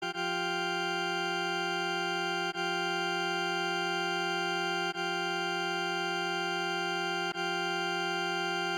nona

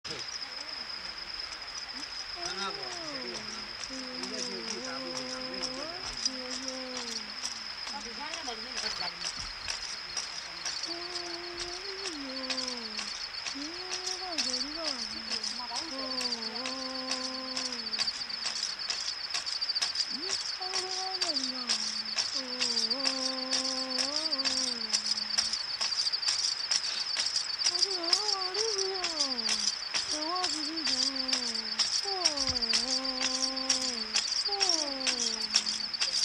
voce che inghiotte lo spazio

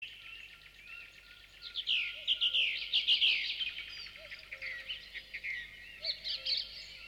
selezione canto uccelli

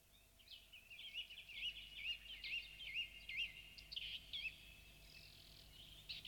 selezione canto uccelli

